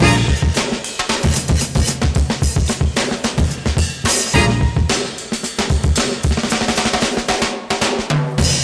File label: Latin Soul Music